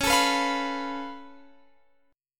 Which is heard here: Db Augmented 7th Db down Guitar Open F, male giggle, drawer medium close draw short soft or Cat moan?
Db Augmented 7th Db down Guitar Open F